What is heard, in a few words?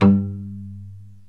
PITZ PIANO